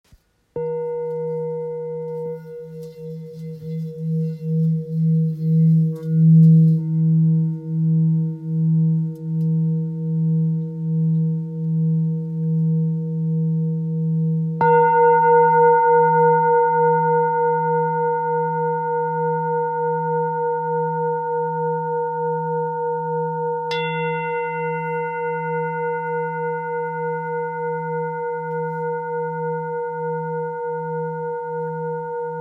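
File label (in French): Bol thick mi, bols chantants tibétains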